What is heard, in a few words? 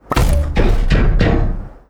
unpackage; snds jack gmod